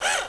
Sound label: drill; robot